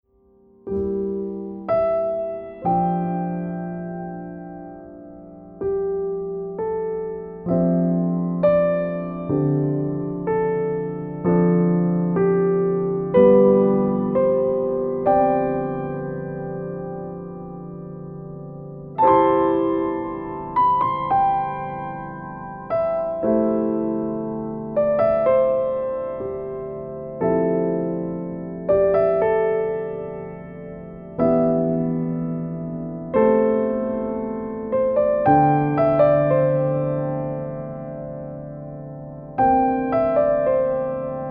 piano

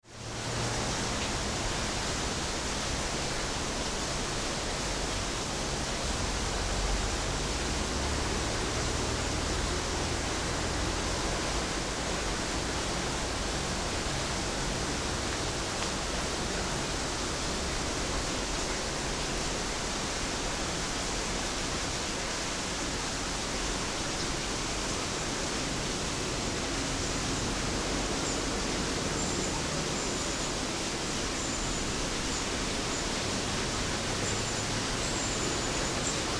water

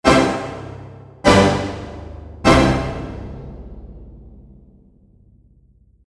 ending jingle

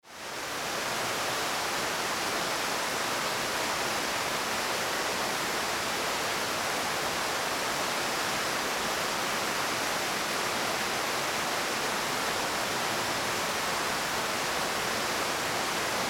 river

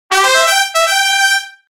comical fanfare

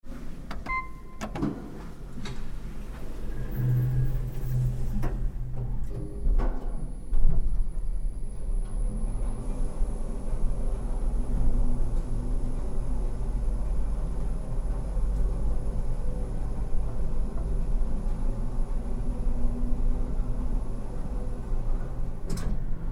elevator

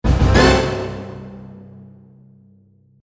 surprise

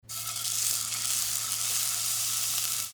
fillet steak

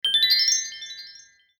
pirorin

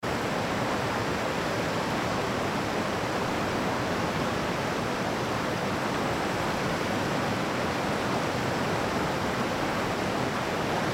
hot spring

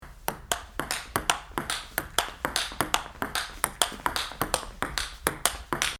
pingpong

